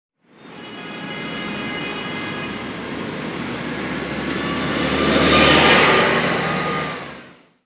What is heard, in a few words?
traffic; midland road